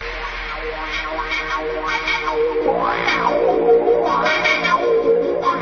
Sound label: Loop